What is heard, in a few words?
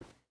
footstep hide carpet walk; Rust